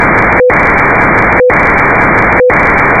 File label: noise and signal